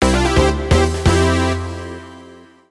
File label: TV Start Jingle